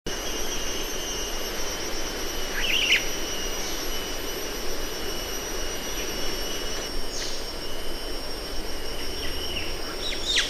bar bellied cuckooshrike

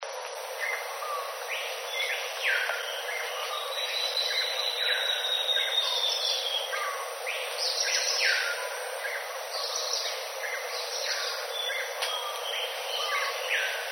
black bellied cuckoo

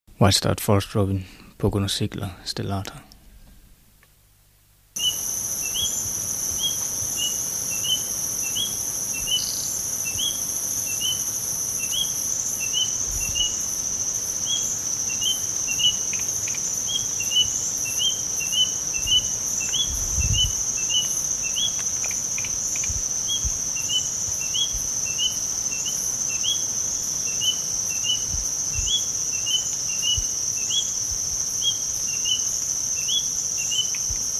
white starred robin

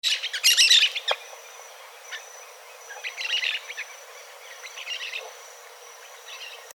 yellow-chevroned parakeet

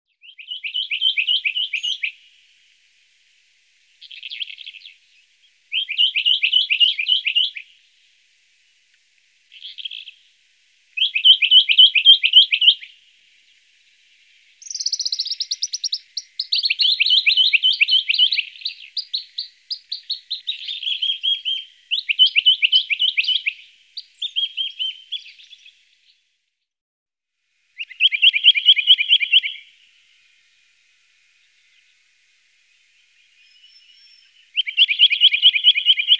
western rock nuthatch

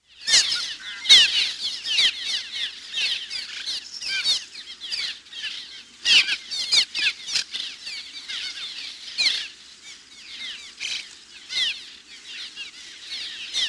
cliff swallow

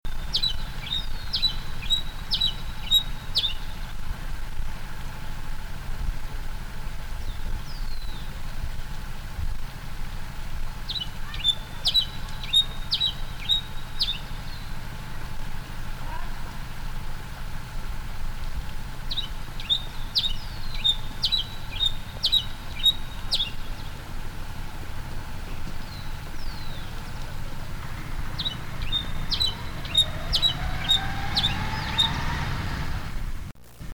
peruvian sierra finch

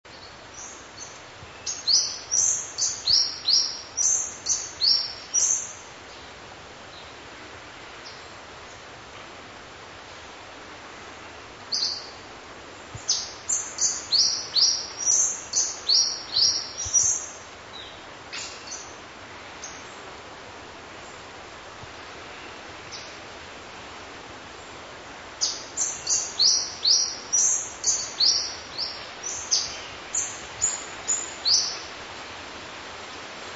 scaly breasted hummingbird

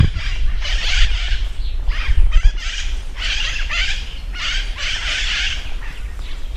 red masked parakeet

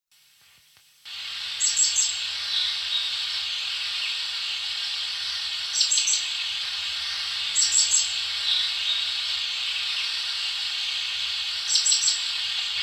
ceylon hanging parrot

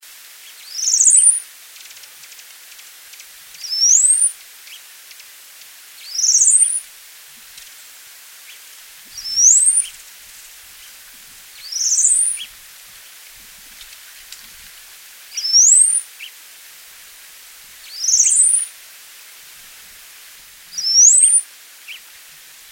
grey winged inca finch

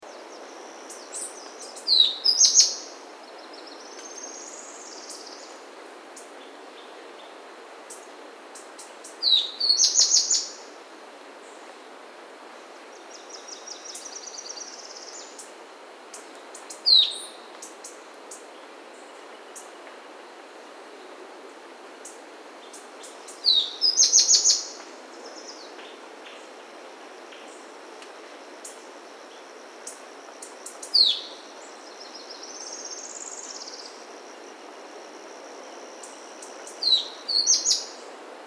tepui brush finch

